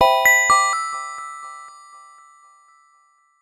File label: Bright, notifications; Lenovo; ZUI 13